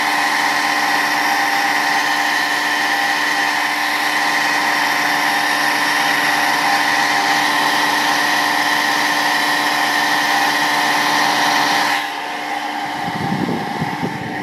Máy bơm nước ở nghĩa dịa Water pump in the graveyard